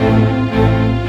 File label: Rock Pop Strings, Orchestral; Sonic Foundry (Sony Creative Software); Orchestral Series VOL-4 - Rock and Pop